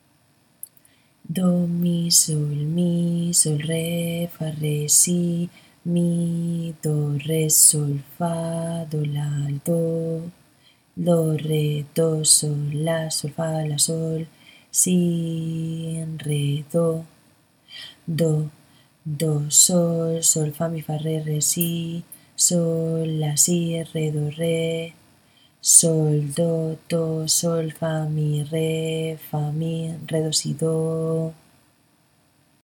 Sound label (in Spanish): ritmo 6-4; Equivalencias